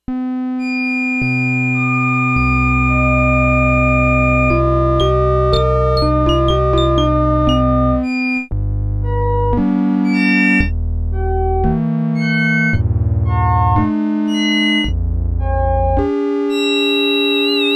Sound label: Delayed Harmonic